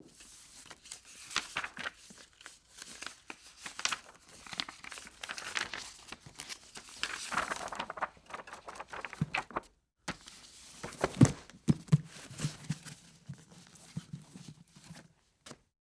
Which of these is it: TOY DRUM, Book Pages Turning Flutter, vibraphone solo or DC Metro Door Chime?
Book Pages Turning Flutter